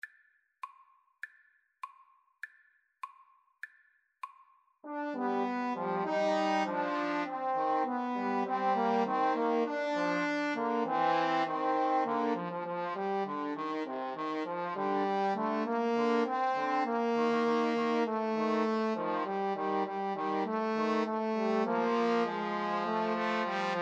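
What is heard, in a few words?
3 trombone karaoke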